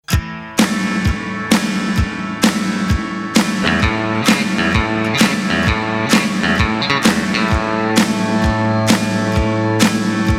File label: instrumental